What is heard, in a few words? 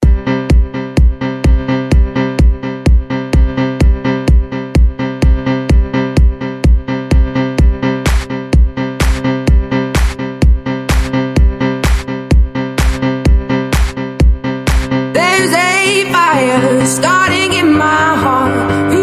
Dirty Noise Meets The Dubstep Remix